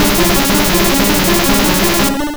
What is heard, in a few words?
Meditate; Pokemon; Gen 2; attack moves; GSC